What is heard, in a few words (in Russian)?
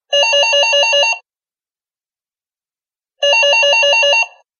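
вызов на домофоне дозвон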